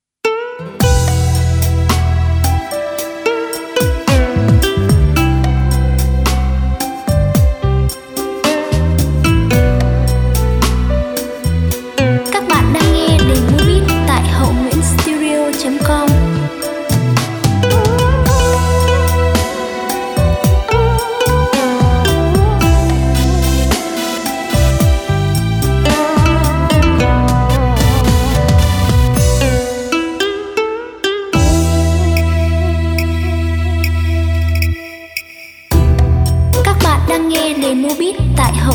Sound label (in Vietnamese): beat